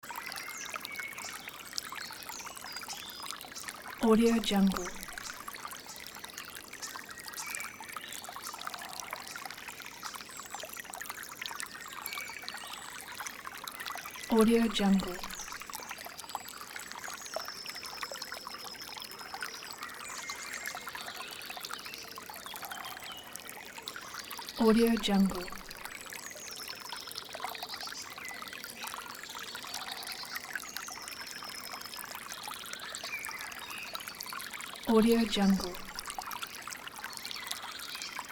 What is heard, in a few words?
forest creek and birds